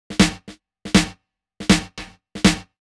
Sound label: KICK 160, Drum Bass; eJay